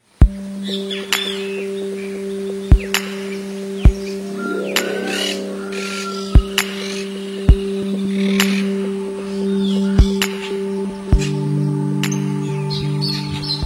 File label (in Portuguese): canto da maracana de colar